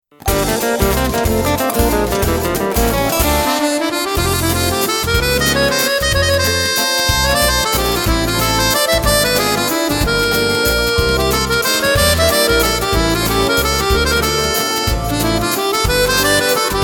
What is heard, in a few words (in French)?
valse swing